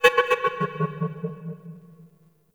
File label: Vocalized Metal; Ambience; Warframe; Grineer Asteroid